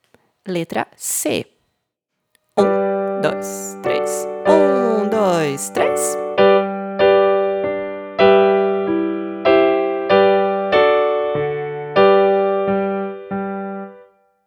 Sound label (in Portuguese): Ritmo Solfejo Ritmico